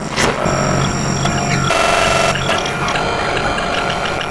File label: computer broken; Quake 4 Alpha; id software